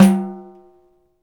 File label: PAN, ETHNIC PERC; Roland LA